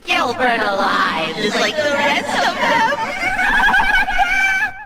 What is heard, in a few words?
laugh